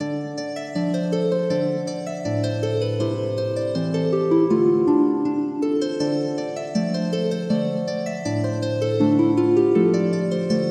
hornpipe; kathy hornpipe

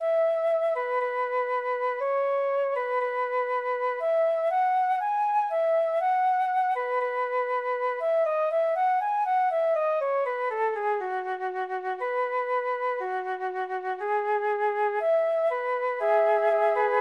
for flute trio; soli deo gloria

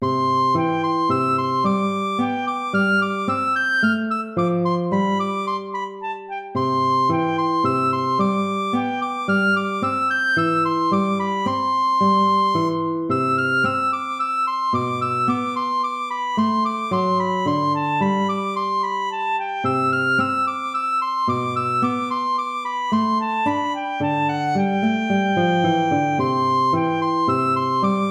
tempo minuetto; hook james

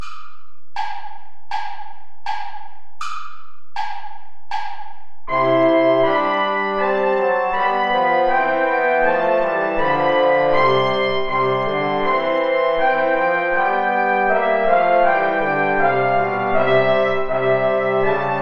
play along without trumpet; bach johann sebastian; ach mein herzliebes jesulein

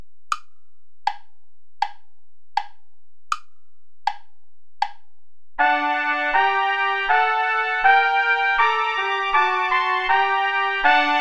play along without trumpet; bach johann sebastian; ach gott vom himmel sieh darein

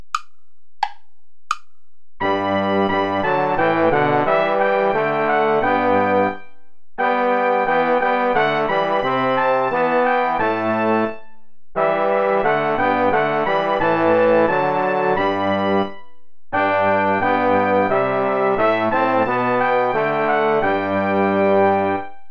play along without trumpet; goudimel claude; sus serviteurs seigneur

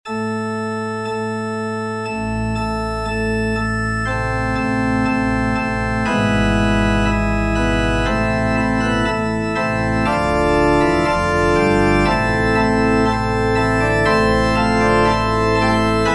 for pipe organ

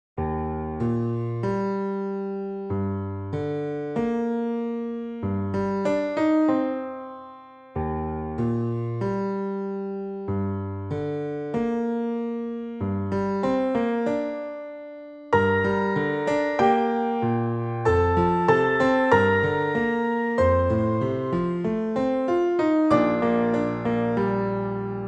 duet for piano in g minor

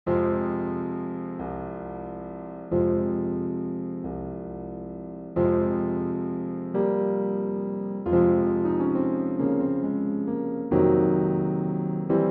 barcarolle for viola piano; faura gabriel

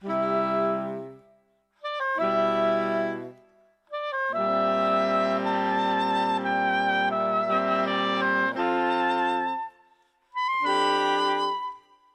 for saxophone quartet; arrivederci mosca

